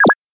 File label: match